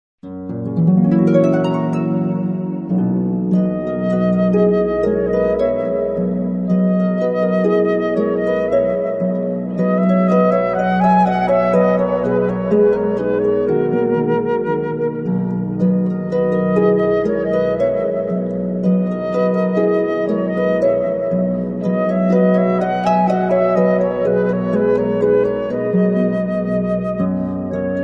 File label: Flute Harp Duet